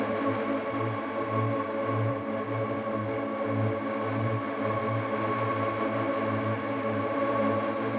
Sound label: Drone; stereo toolkit samples; Non Tempo Loops